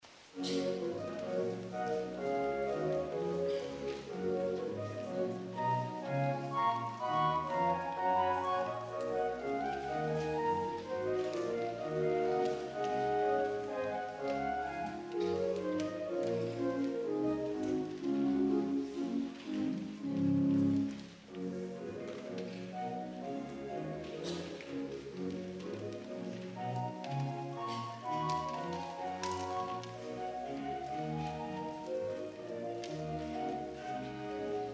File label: Scherzo, organ; Five Short Pieces, 1929